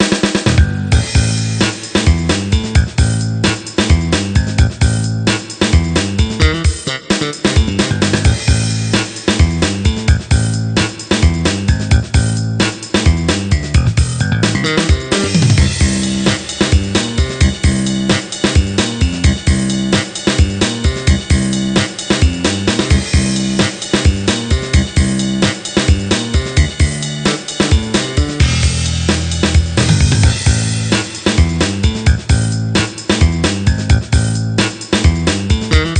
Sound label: Music Game General event